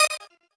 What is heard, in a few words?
normal hit normal